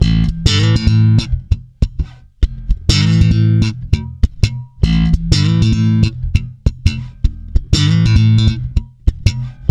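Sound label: A, funk swing, bass; Spectrasonics; Bass Legends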